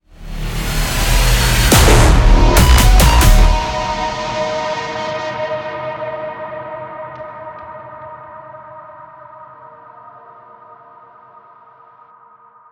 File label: Boss 2 Ease Out; Voidbreaker; ps5 windows xbox series xs